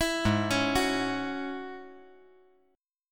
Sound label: Ab 7th Suspended 4th Sharp 5th down, chord